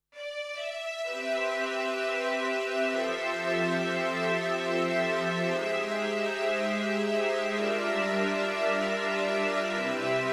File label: Strings